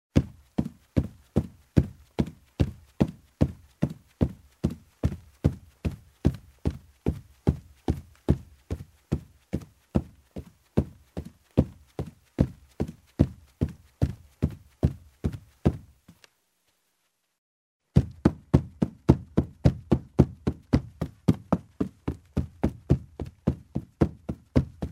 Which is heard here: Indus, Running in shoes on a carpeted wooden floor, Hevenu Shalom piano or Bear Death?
Running in shoes on a carpeted wooden floor